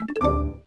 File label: messenger new alert